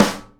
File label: SNARE, Live Snares